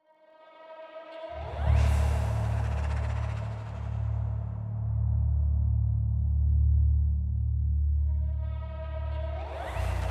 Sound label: Amb Level; underworld ascendant